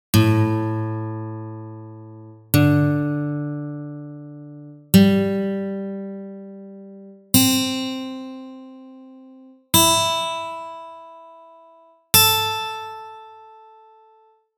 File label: guitalele